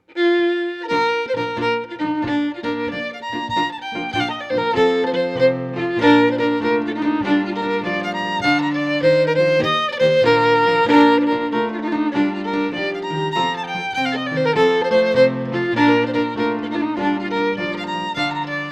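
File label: Jig, Scottish Fiddling; Morrison 1797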